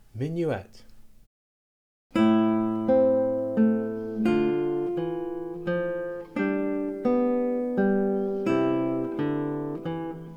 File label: Minuet